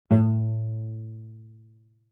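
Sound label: Cello pizz fortissimo sul G A2; MIS Pitches - 2014; Strings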